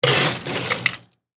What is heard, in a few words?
Fountain Drink, Soda, Machine, Dispense, Short; Soda Guns; BlastWave